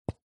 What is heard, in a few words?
tap empty